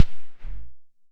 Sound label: Kick Gate Rev Bright, Tama TS-206